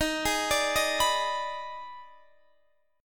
D sharp Major 7th Suspended 4th Sharp 5th, Guitar, Open D; chordshape